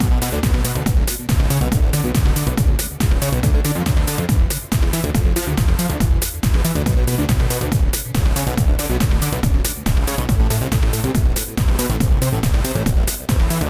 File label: random loop, music